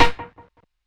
SNARE RIMSHOT, DRUM N BASS KIT; JUNGLE BEATS

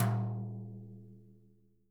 BRUSHES, Living Drums

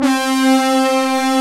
LINEAR SYNTH

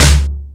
FX Sounds, Drum Machines

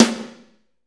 SNR, Roland; Northstar - Drumscapes Roland

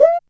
DR-660 Perc, Drums, Boss DR-660

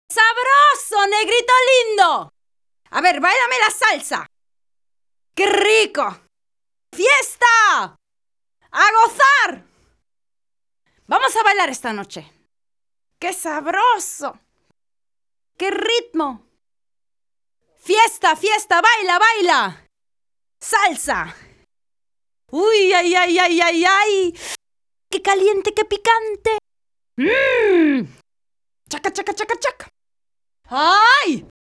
Salsa Picante; Masterbits; World Zone VOL-3

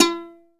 HALAM PICKS; Best Service; World Colours

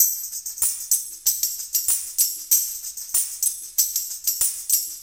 TAMBO CORPS 95

